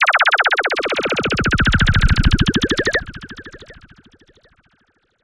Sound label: Force, downbeat